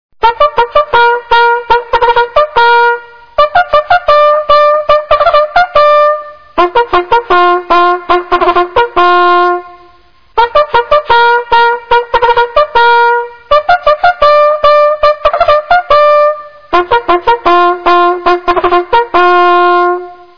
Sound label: mess call, bugle